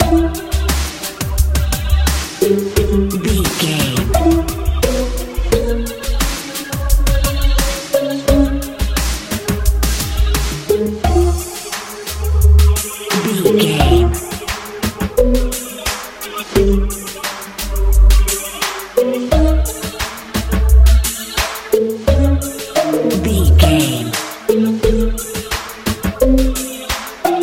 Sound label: happy warm modern pop